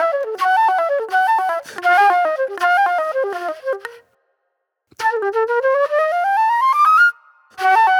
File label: Super Soul Flute; Sonic Foundry (Sony Creative Software); Manhattan Flutes; The Funky Mama